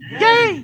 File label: yay